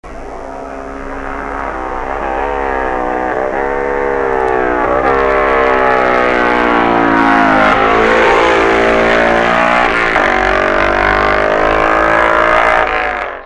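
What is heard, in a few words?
Norton, motorcycle; 1966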